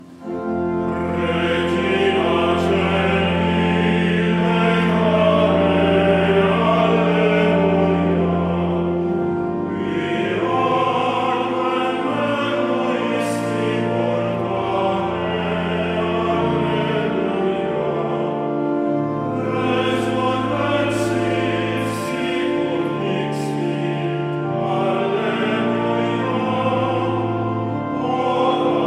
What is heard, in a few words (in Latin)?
REGINA CAELI; BOUIN VITRAUX EGLISE